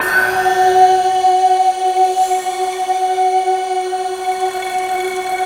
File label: F#4, bamboo